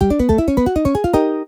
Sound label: Win Reveal; loki Wild Tiles Desktop; Ingenuity Gaming